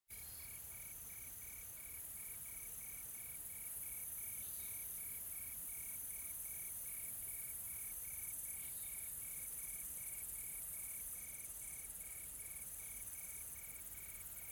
AMBIENCE Additional Tropical Forest Soft Insects Calm, Nature South Africa; SmartSoundFX